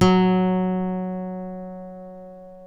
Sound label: F#2 FLAMENCO, GTR; AKAI S6000; WorldSounds